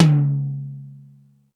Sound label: Toms 1, drum machine